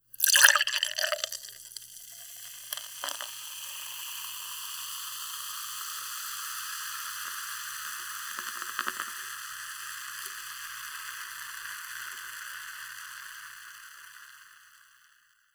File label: filling beer into glass